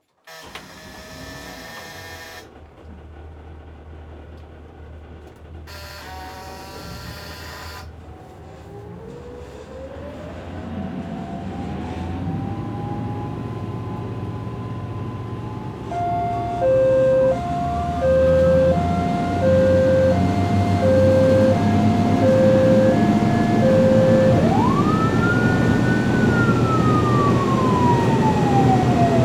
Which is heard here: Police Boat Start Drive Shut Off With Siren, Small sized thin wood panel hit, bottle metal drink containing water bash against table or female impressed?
Police Boat Start Drive Shut Off With Siren